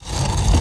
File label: Old Switch; Playable Tutorial Level